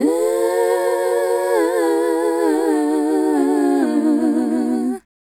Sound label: Female Group, Hip-Hop and RnB Vocals